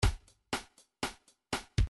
reggae 1bar

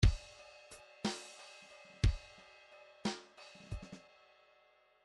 midi file grooves 3 4; Bdf Midi File Grooves

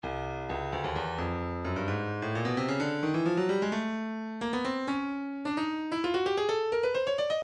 breakbeat midi files 065 069 bpm; Stylus; 065 Nip & Tuck

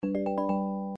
nes coin; Nes Gradius

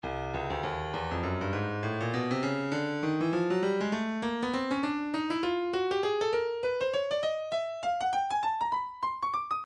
breakbeat 100 bpm; slugworth d; Stylus Breakbeat Midi Files

